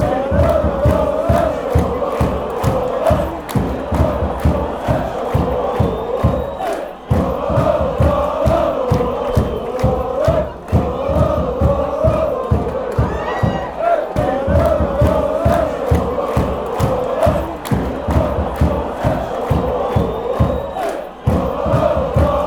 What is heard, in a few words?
lo lo la lo, fanchants